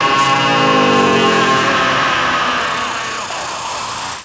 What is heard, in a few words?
Kaos FX, Ambient Grooves